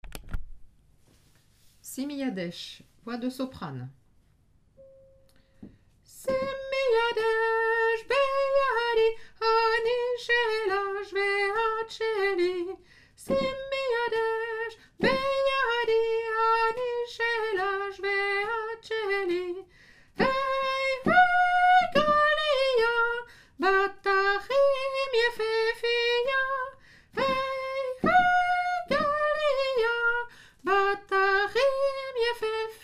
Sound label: simi jadech soprano